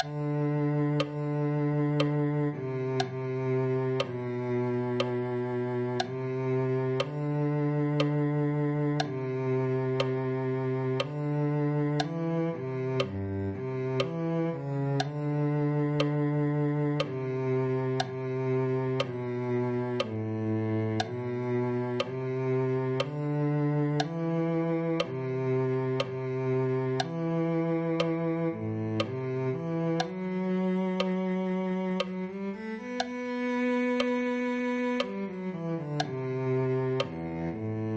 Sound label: cello